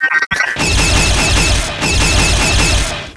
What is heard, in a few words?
INFANTRY; X-COM